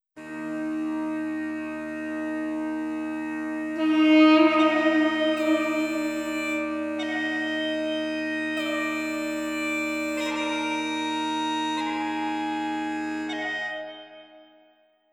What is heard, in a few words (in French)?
Generique Uilleann Duduk